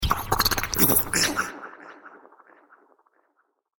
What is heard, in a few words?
Galactic Gremlin, alien sound effect; science fiction sound effect; Andrew Fleming